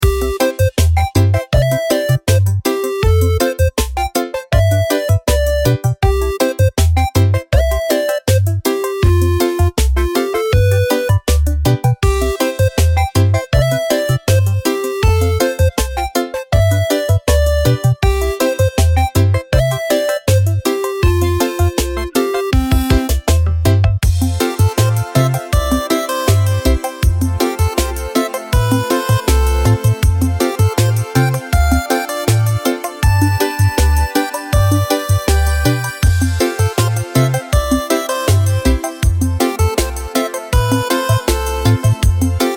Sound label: reggae island; freepixel; Retro Genres